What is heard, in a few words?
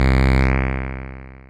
Lizard, Analog Bass; Ableton; Factory Packs; Retro Synths; Analog Bass Vol 1